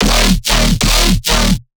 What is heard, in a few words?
Bass Shot D#, dubstep; One-Shots; Shredder; Ghosthack Advent Calendar 2021 - Day 16 - Dubstep Basses